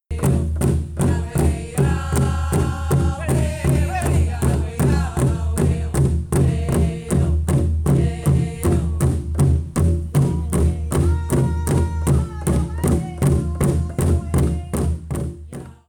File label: Drum; carleton place marks national indigenous peoples day